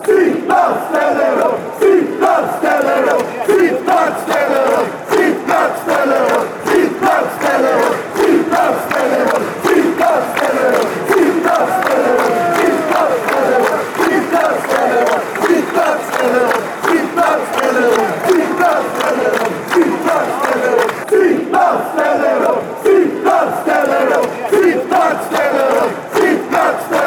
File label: their seats stand fanchants